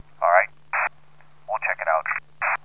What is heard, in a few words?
storm